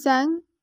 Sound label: bell